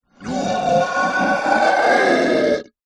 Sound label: horror monster demon screech